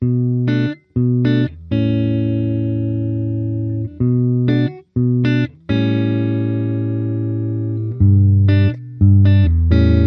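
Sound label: Crate FW15R clean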